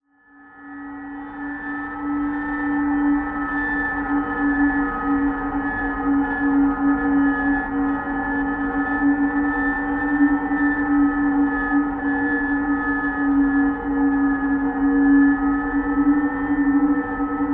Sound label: Atmosphere Angst; DSGNErie; MatiasMacSD; Seclusion